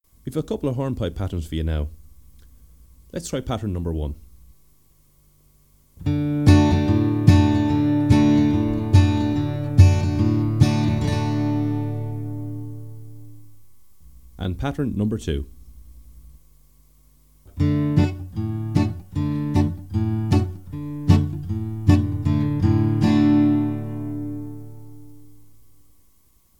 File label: Hornpipes